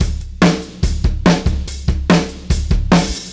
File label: Beat Loops; Zero-G; Monster Beats